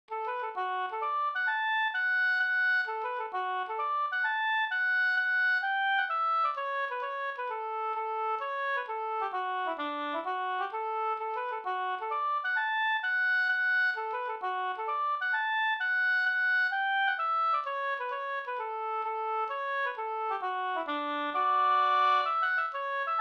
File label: mazurka